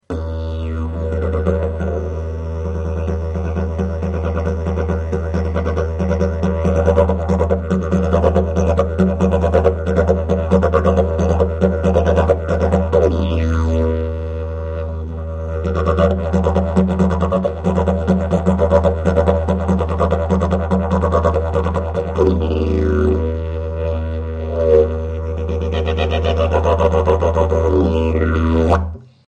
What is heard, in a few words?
didgeridoo